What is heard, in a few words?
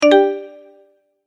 multimedia tone start